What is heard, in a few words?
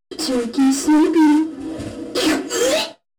turkey slurpee uaghh